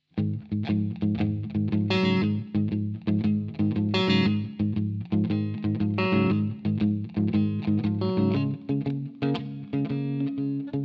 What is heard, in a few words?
shuffle feel